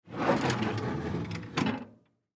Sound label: Hotel Room Drawer Close Slow; Drawers; Household; Blastwave; Foley